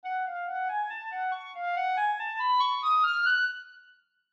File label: Clarinet; examples in treble clef